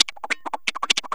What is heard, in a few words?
guitar bits